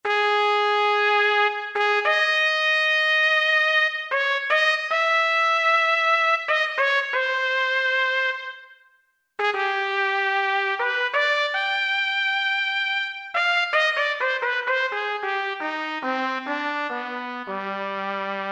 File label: Trumpet, Roland SR-JV80-02 Orchestral